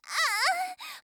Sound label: die girl